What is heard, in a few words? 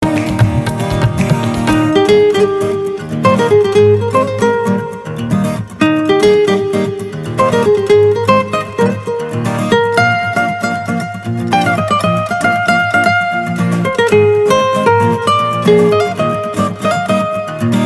instrumental ringtone